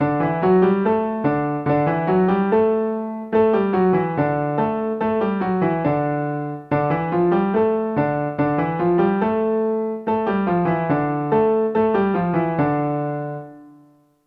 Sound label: 16th note in D and Dm